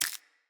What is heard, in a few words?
Root Spider Step Dirt, Foley; Animals